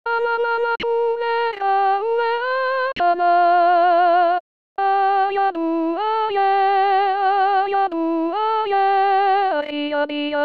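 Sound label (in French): soprano seul voix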